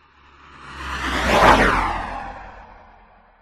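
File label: train sound; vlak; crossthatroad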